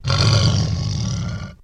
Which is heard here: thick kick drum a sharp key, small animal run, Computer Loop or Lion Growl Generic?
Lion Growl Generic